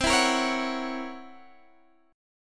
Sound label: C 7th Flat 5th Flat 9th C, chord